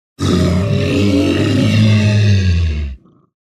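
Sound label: moan of wounded orc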